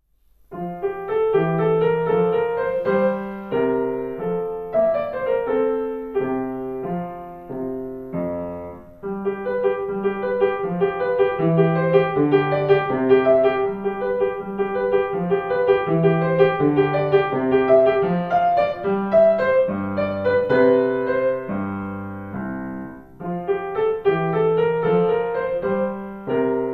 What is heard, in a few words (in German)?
mozart kv5, Klavier, Menuett in F Dur, KV 5; W.A. Mozart